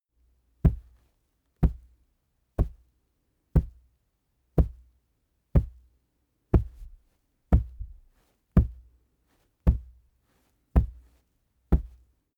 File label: monster footsteps sand; schritte